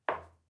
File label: heels clack, Footsteps